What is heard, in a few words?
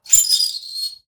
Just Metal Squeaks and Moans; soundbits